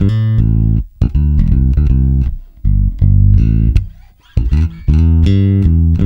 SHUFF A, Bass, SHUFFIT; Spectrasonics; Bass Legends

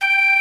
60's Organ; OMI - Universe of Sounds; EII Factory Library

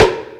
rim, snare kit; Big Beat Samples & More; AKAI

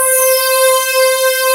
SWEEP; Syntec; Wall of Sounds

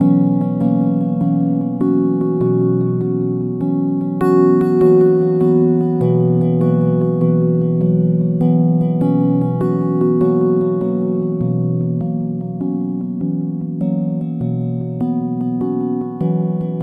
Hi Pluck Echo, Synthetic Pulses

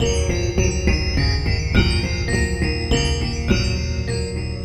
Oriental Delay; Sonic Foundry (Sony Creative Software); Ma Ja Le Ethereal Textures

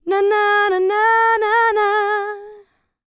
LICKS, Vocal Planet, R&B; ILIO; Dance; LT R+B

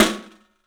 STEEL S, drums; 3D Sound

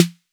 Snare, Roland TR-808; Roland MV8800; TR-808mk2

